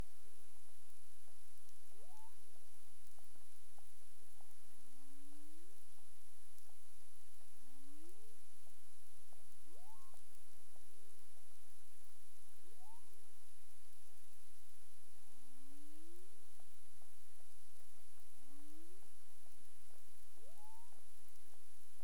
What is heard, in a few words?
clic; BERMUDE